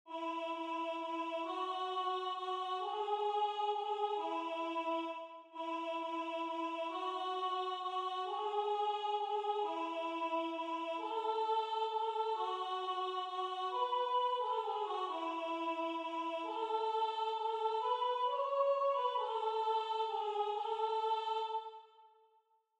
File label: Soprano